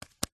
leisure playing card remove from several and place on surface; zapsplat